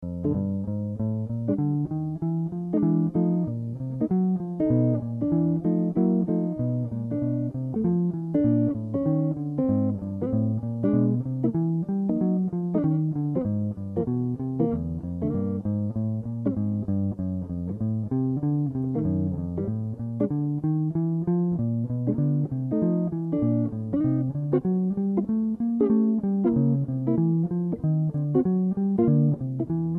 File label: blues